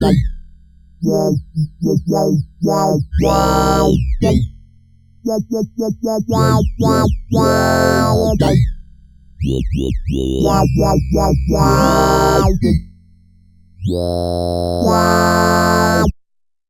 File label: Volume and filter shaping; sequential